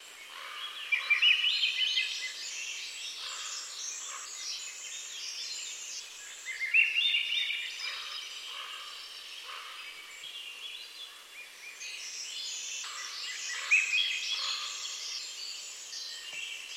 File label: Swainsons Thrushes and Crow